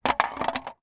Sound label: empty drinks can roll or drop on street; foley